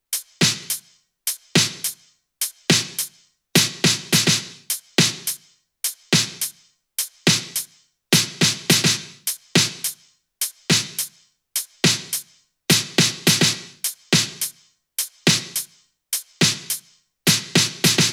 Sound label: top loop; Drum Loops; SD MIDNIGHT Sample Pack; Slate